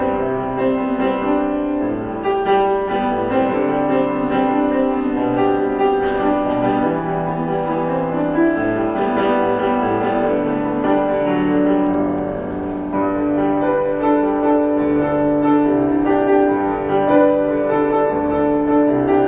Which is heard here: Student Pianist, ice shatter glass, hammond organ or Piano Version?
Student Pianist